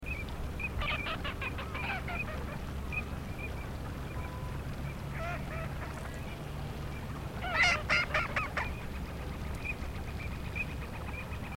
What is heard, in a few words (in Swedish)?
amerikanskkricka